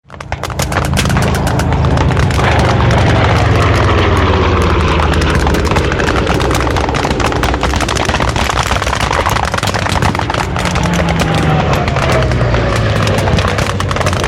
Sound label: Warfare Battlefield Ambience World War Ii Reenactment; War Sound Effects; SFX Bible 6